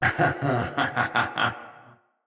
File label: Laugh, Battle, Barks, Dialog; Mendicant Wazard